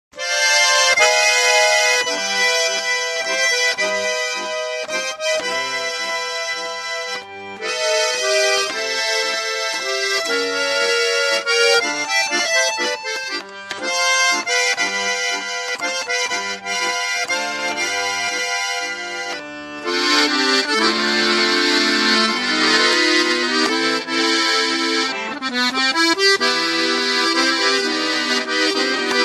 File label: Dallape 120 B French Tune, accordion